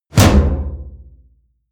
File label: percussion